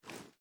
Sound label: sm uzulu reload xxmag arm; gmod; iw8